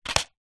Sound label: box card small safety pins lid off set down; household